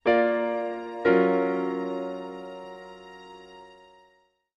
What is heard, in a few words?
Music Logo Piano Pad